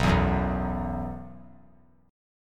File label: B Suspended 4th; Bsus4 strummed; JGuitar